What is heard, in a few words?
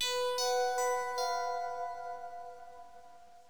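suspense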